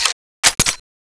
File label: clip out; cso; tornado